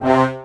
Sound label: Brass; Synthetic's Serum Collection